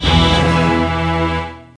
Power Down; rayman 3 hoodlum havoc gc ps2 windows xbox 2003

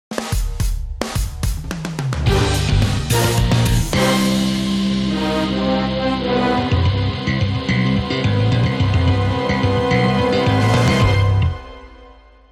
Jingle Good; Wolf of the Battlefield Commando 3; Xbox 360

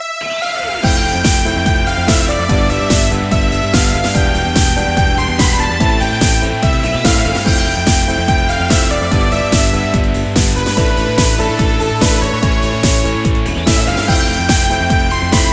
BGM Minigame Volley; Kenka Banchou 6 Soul Blood; 3DS

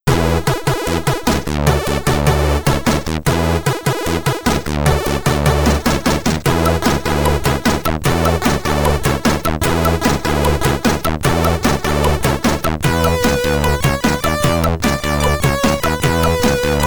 BGM, commodore 64

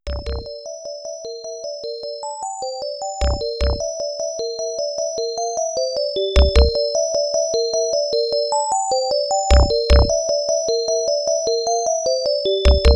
Stage Music, X68000; galaga 88 1990; Scroll Stage

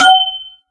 Metal Pipe Hit; Angry Birds

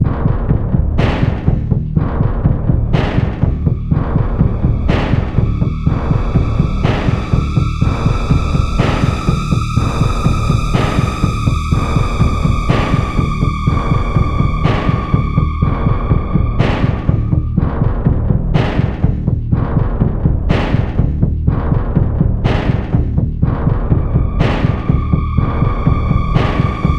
pulse

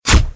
Cast Arrow; endless world idle rpg gamerip 2019

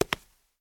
Step Marble

